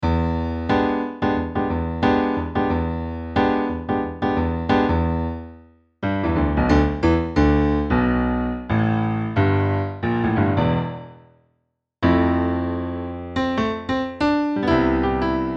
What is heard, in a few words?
piano loops